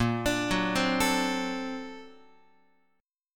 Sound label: A sharp Augmented Major 9th Guitar; arpeggiated; JGuitar